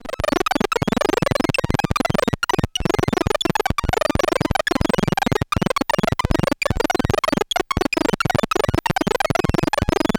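Sci-Fi Computer Retro Computer Glitches Sawtooth Chaotic, ARP 2600; Bolt; ARP 2600- Droids, Blips, Drones & more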